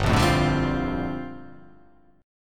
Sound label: G Minor 11th, chord; Gm11 strummed; JGuitar